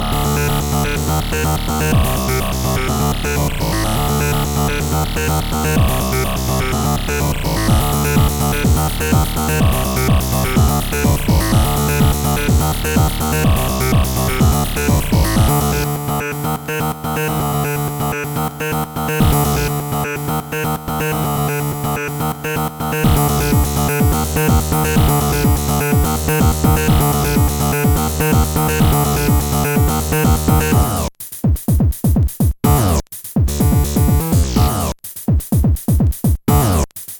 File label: chiptune; tekknohile